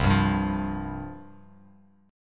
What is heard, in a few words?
C Suspended 4th C, Bass Standard; Csus4 strummed; JGuitar